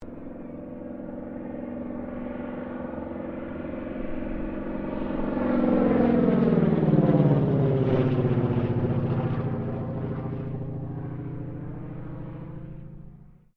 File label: AIRCRAFT CAC CA-25 WINJEEL PASS, fighter trainer; RAAF Winjeel fighter trainer; Airplanes; Vehicles